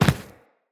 Inf Medium Land Default, Footsteps, Enemies, Infested